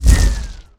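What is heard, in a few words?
spell destruction fail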